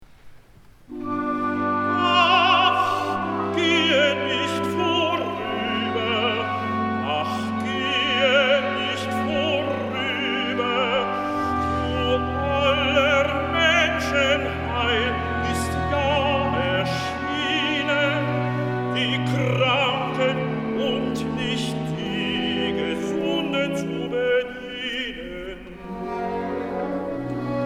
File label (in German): Recetativo Tenor